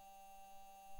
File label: mic, mars2020 edlcam